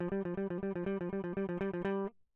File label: electric